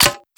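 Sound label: weapon foley ranger reload clip 1 in; Call of Duty Modern Warfare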